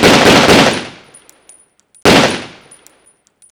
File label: GUN SHOT, TOWN FX; USB Soundscan vol.02; Underground Hip Hop; AKAI